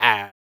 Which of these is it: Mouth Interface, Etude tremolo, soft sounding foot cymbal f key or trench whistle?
Mouth Interface